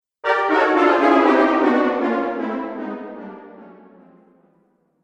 Cartoon Failure Brass Delay; Cartoon Music Sound Effects

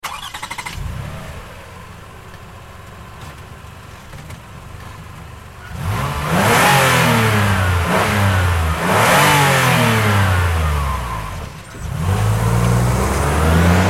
Car Highlander Start Revs Away Fast; 2005 Toyota Highlander V6; Cars; Vehicles

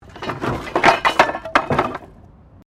Wood Metal Move

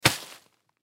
Sand Bag Set Down; Playgrounds; Backgrounds; Foley; Blastwave

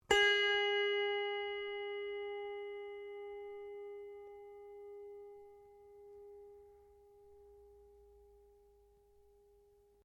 ZITHER PLUCK, Very old wooden zither or autoharp; Strings; Musical

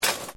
METAL SCRAPE SMALL; Sound Effects:Materials

